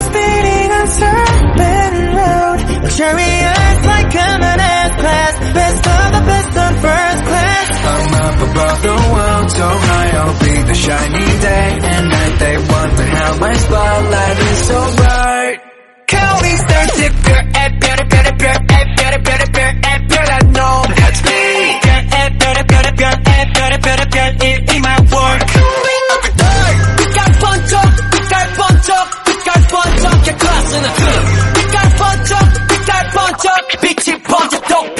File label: korean song